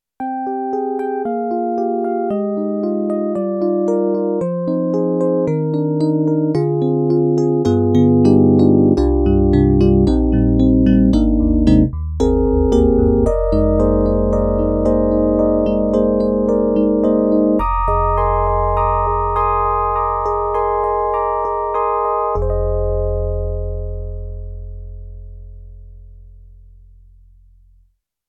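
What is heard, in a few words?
SD-80 FM Electric Piano; EDIROL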